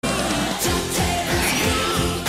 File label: WooHoo; DuckTales